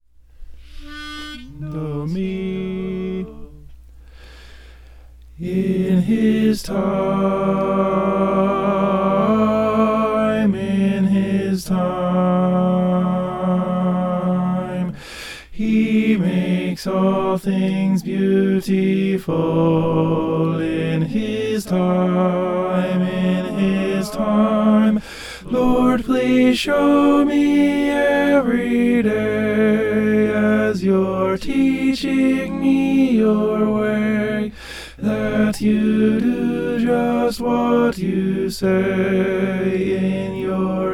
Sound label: tenor